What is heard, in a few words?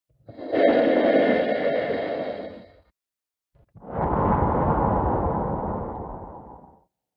Dock Breath; Oxygen Generator; Thalassophobia Restored